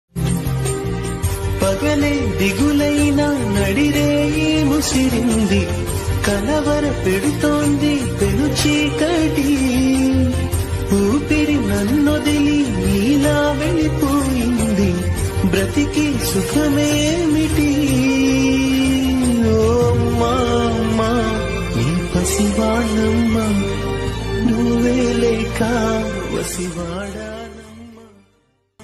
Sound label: song ringtone songs; ismart ram